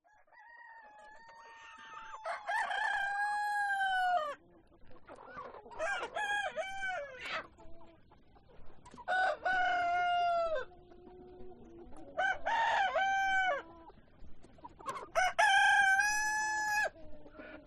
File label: Chicken farm; west wolf